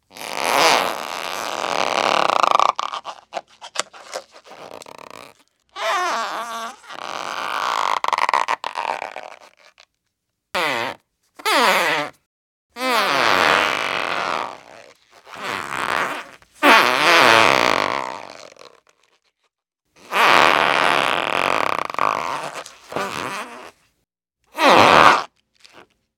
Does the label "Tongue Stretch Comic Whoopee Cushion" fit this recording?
Yes